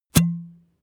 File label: glass bottle drink stop hard